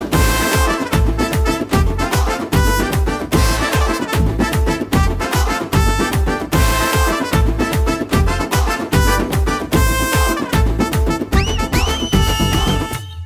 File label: instrumental Ringtone; Pokkiri